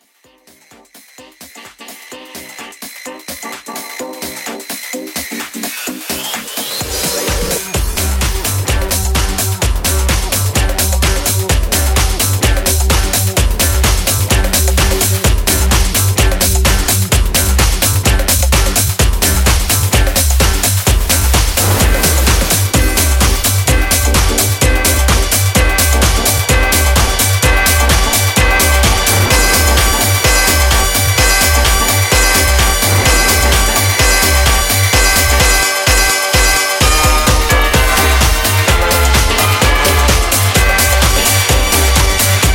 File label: House Club Mix 128 Bpm